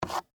Game Dice Up, Pick, From, Board; Sports; BlastWave

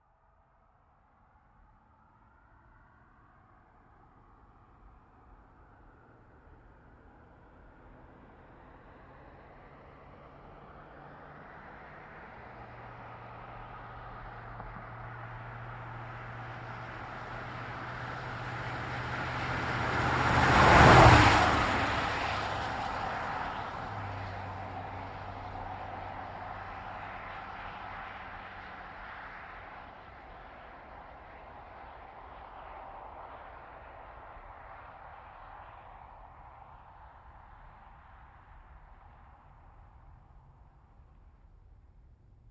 Truck GMC Savana By Fast; 2010 GMC Savana; Vehicles; Trucks; BigRoomSound

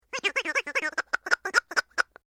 Toy Squeaky Piston Long, Strange Toys; Miscellaneous Toy

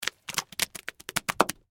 Mud Drop Slow, Impact, Mud, Drop, Slow; Miscellaneous Impact

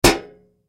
Bullet Impact Metal Gun Fire Hit; Warfare; Combat

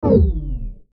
Descend Fly By Power Down; Descending Sound Effects; Blastwave

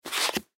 Flip Flops Pick Up; Carpet; Shoes; Objects

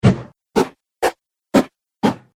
Fast Passing Air Swooshes; Whoosh Sound Effects

